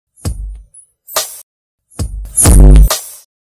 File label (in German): komischer beat